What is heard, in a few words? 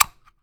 can